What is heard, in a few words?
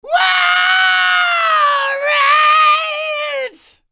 ROCK, MALE HUMAN; ILIO; Vocal Planet